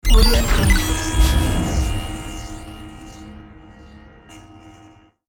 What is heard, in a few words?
electro signal